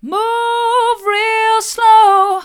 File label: move real slow mid, vocal